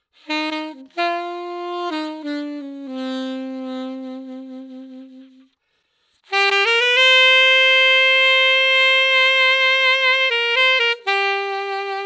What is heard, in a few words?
sax; compressors